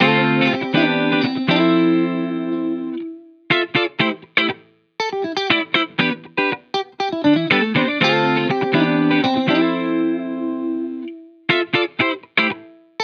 Guitar Bpm120 Key A minor Full, 70s Guitars Full Mixes; Fox Samples; Back to the 70s Guitars